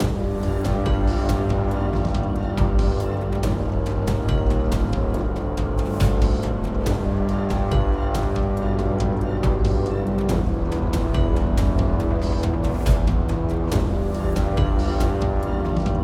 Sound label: CAVE COMBAT 140 BPM